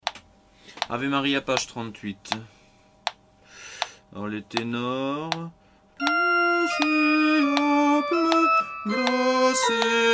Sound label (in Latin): Ave Maria basse; Vittoria